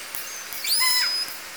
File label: call, L pod, Clicks